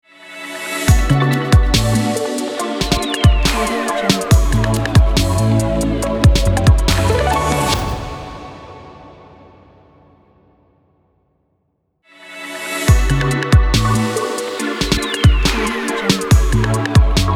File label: chilling faster logo ident